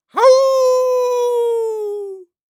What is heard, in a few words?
Horror Scary Human Male Howl